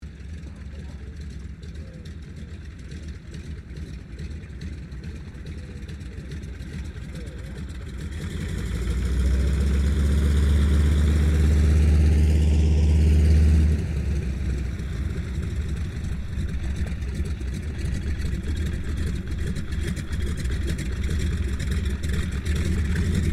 AIRCRAFT BOEING PT-17 STEARMAN TAXI, Stearman Trainer; 1939; Airplanes; Vehicles